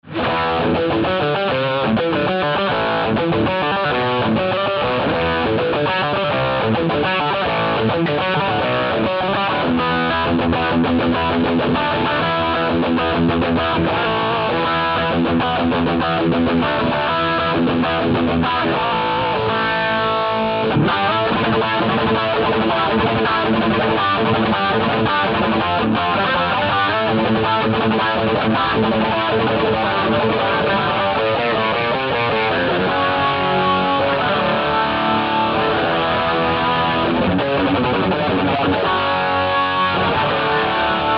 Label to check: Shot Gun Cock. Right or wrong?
Wrong